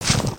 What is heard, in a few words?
Pet Warthog Dig; creatures pets